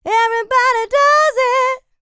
LICKS, Vocal R&B & Dance; ILIO; Vocal Planet

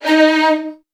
D#1, Strings, VN 16TH; ILIO; Kirk Hunter

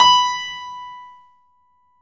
KEY HRPCR, Roland; Roland - LA Composer vol. 1

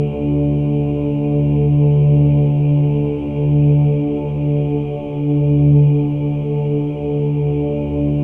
OHS, Symphony of Voices; Spectrasonics

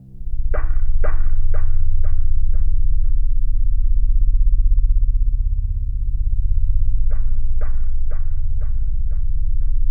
C1, Synth Leads

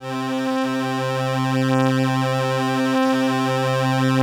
LAMBDA, String Machines

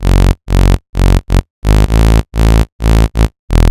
Synth, 129bpm, Nu Dance Electro; Zero-G; Soundclash; Combi Loops; Rude Bwoy

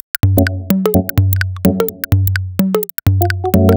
Thirds 127bpm, House Drumloops; 90 s Sample CDs; Zero-G House Fabrik Drumloops; ZG Drumloops

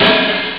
hihat close; Amiga Soundtracker Sample Packs

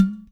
pot; Spectrasonics; Liquid Grooves; POTS + PANS

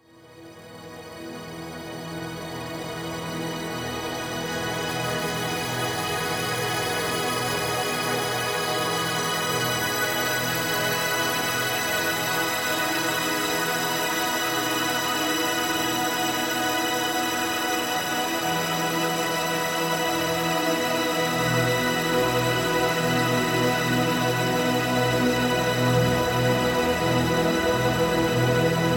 Guitar Textures

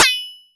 SWORD FX; Vintage+

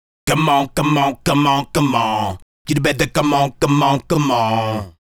Male Group, Hip-Hop and RnB Vocals

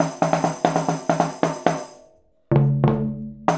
Tambourine Skin and Conga

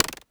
polyvox clap; Formanta Radio Plant

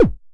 Synthe Drum, Daytone Drum Synthe RDS

